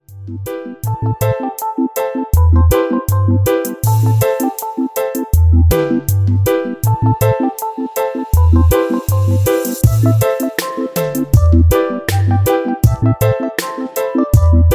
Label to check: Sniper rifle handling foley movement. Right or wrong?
Wrong